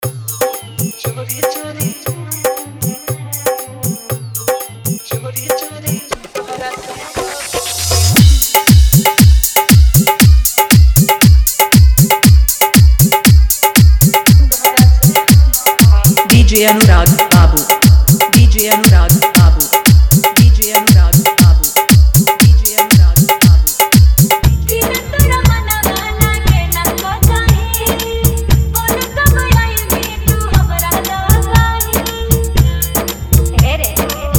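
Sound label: New Viral Bhojpuri Dj Song Mix Dholki Bass Mix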